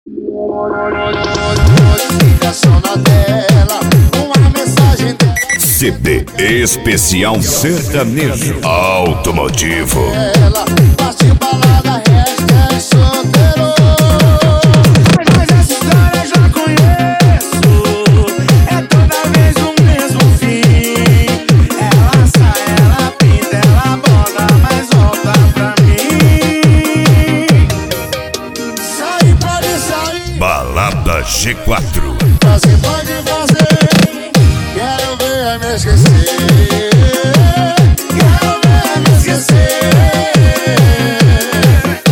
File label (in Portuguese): sertanejo automotivo